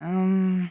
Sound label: umm; hajime; drv3